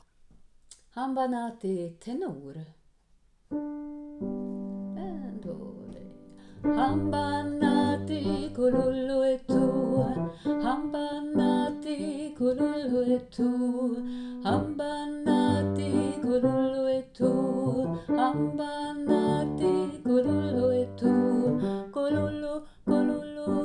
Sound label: hamba nati tenor; alla kan sjunga koren vi sjunger i höst